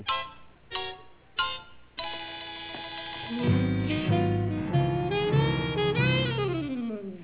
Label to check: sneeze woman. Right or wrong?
Wrong